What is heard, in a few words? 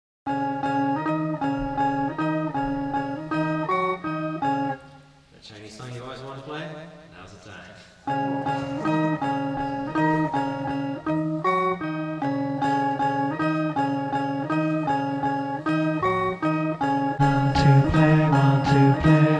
Chinese Song